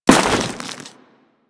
Crewman Step Ice, Footsteps; Warframe; Corpus

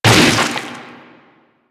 Arm Attack Hit; Enemies; Warframe; Infested; InfestedNinetyNine

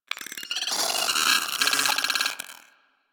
Arachnoid Micro Vocal Idle; Warframe; Corpus

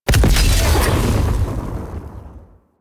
Throwable Coolant Item Explosion; Ambience; Warframe; Venus Open World

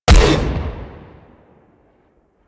Long Gun Shot; Warframe; Thano Tech Arch Long Gun

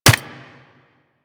Nunchaku Catch; Warframe; Tenno

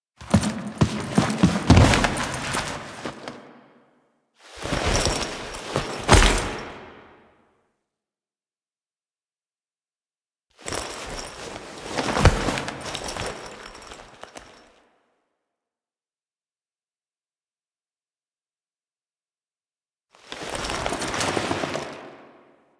Foley; Duviri; Teshin Death

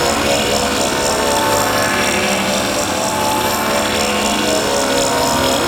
Corpus Ship Gun Battery Machine Loop; Ambience; Props; Warframe; Corpus Ship Remaster

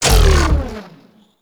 Mech Stop; Warframe; Vehicles; NechroMech

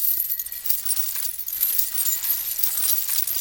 Shared Fate Beam Loop; Warframe; Warframes; Pax Duviricus

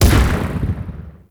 Swarm Missile Explosion; DE; Warframe; Weapons; Sentinel; Helstrum

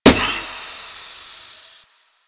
Car Tire Pop; Warframe; Ambience; Nineteen Ninety Nine

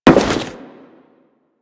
Crewman Step Default, Footsteps; Warframe; Corpus

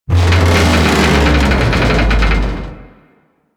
Metal Slam Wind Up; Enemies; Abilities; Warframe; Teralyst; Rainalyst

